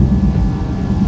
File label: Warp Core; Trek